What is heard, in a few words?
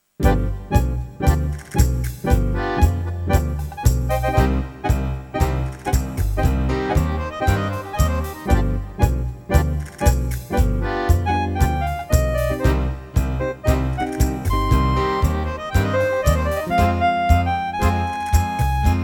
Tango Cm